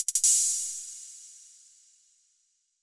hihat high

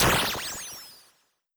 teleport slow disappear

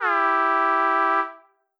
synthesizer vehicle horn high

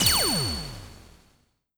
ice beam

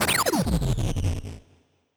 weaken

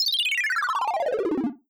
synthesizer descend medium long

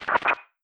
filtered noise

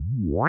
inchworm locomotion slow

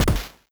hit lowbit middle

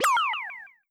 exclamation mark

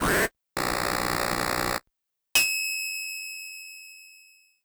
toaster oven lowbit start to finish

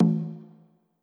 conga drum low hit

